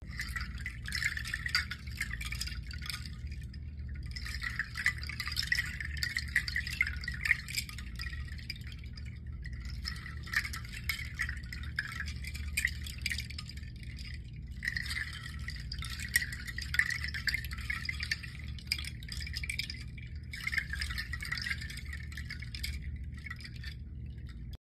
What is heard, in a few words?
Mixed Seed Chimes Large